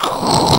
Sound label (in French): raclement bête